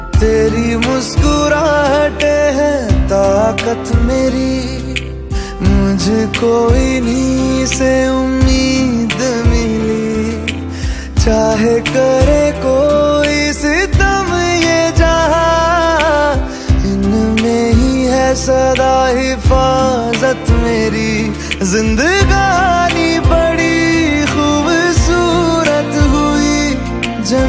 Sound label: Cute Hindi Love Song Ringtone